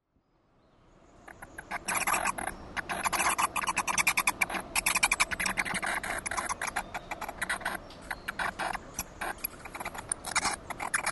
Morcego Acores S Miguel

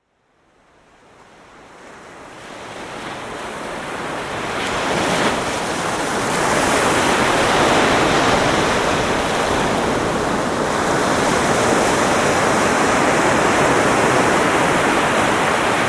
Praia Ilha Porto Santo Madeira

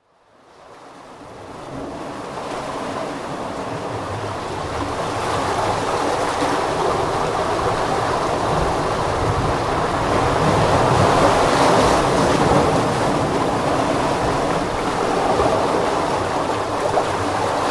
Pedra que Pica Santa Maria Acores

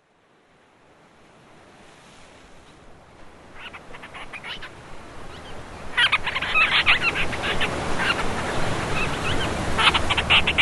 Painho Madeira Graciosa Acores

